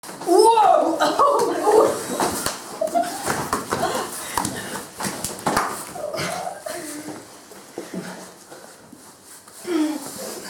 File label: brutal female wrestling